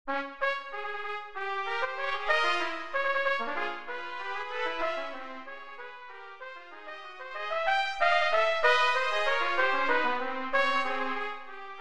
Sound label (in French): 2 trompettes; c'est mon robot